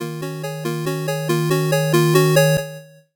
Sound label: 8bit jingle slow